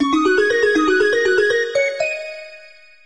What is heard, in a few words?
Symbol Wins High; Ancient Scrolls